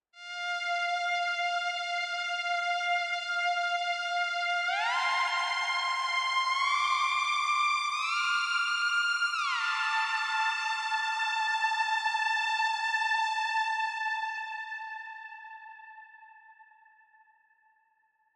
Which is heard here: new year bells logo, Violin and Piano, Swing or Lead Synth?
Lead Synth